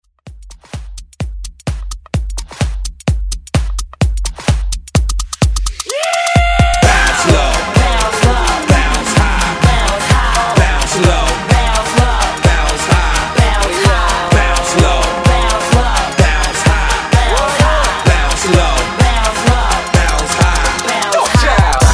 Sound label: Hype Re Drum 128 Bpm; DJDOLLS